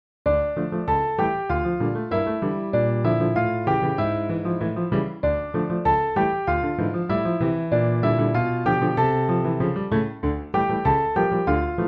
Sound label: Piano solo